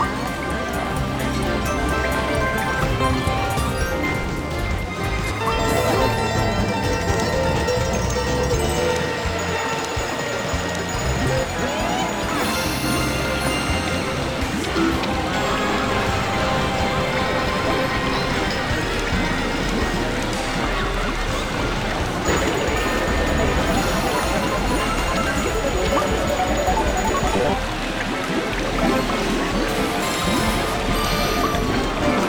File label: Wolf Loop; Real Deal Slots; Lobster Larry; SlotsOL